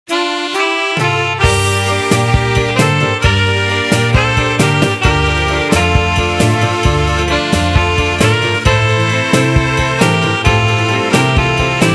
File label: Tango Beguine